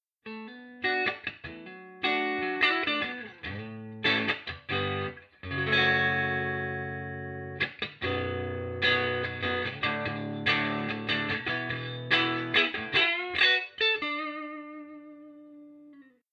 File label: clean